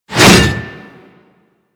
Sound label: Axe Heavy Swing; Warframe; Weapons